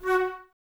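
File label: flute, Woodwind; Miroslav Vitous; Woodwind Brass Ensemble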